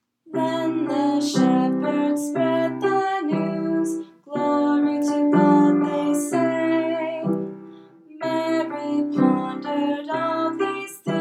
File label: recitative